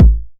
909 BD; Goldbaby Tape808 & Tape909; The Tape 909

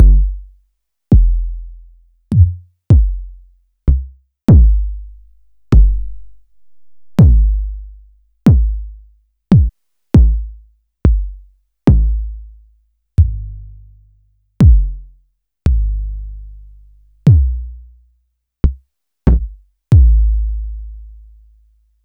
House Kicks; M8; Sliced

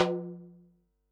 tom, Pearl SC-40 Toms

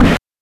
BANK1 SD, NES DPCM; MIDINES

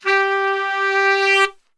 G 1 SAX, Brass Super Section; Best Service

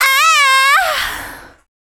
FEMALE, Dance Vocals

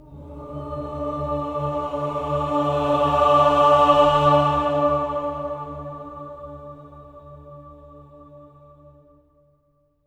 octave A3, swell octave, voices

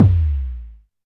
Kick, Alesis DM5

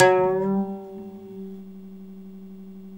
OUD GL SUP G

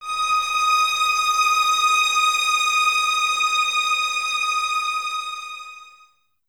Violins D#6, String Ensembles; Light; Miroslav Vitous; 11 Violins

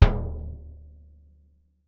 BD MF, Percussion; Miroslav Vitous - Percussion & Harp

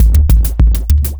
Tribal Seq, Beats; Sonic Foundry (Sony Creative Software); Ma Ja Le Ethereal Textures

